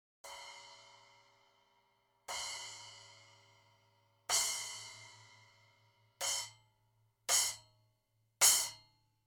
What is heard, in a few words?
Splash Cymbal Hickory Sticks